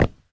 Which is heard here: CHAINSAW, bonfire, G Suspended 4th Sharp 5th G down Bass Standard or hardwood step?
hardwood step